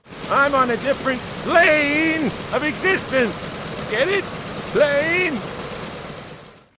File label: Gyrocopter Plane